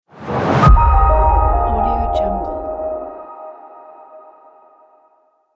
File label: quick logo